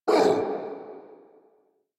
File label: Pain Lich A